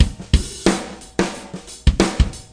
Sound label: 089 bpm big drum swing